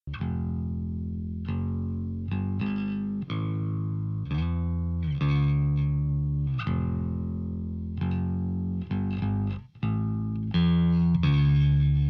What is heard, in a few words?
Clean Rick, played on a Rickenbacker 4001; ARIA/DIAMOND FUZZ(IBANEZ STANDARD FUZZ)